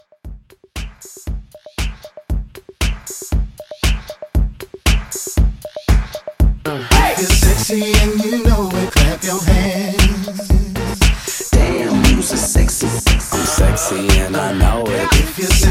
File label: Sexy Hype 117